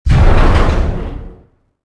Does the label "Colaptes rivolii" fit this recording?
No